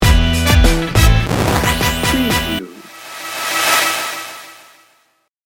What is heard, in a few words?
hip-hop funky sting bumper